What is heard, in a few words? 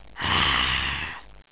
mummy alert